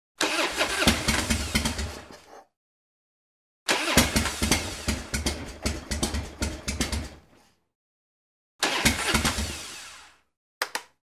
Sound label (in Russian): Avto ne zavoditsia